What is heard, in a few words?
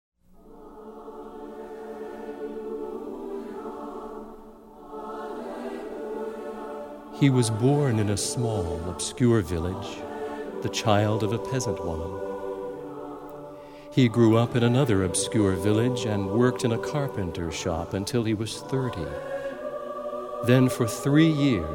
Alleluia with Narration